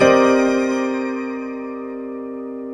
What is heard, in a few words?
scynth, Synths; Notes